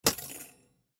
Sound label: Hanger Hit, Hanger Hit Rattle; Household; Blastwave